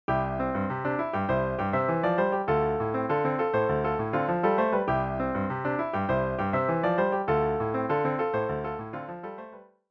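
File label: vamp, piano rendition of the chords, C Dm7; song intro; 2-Chord Vamps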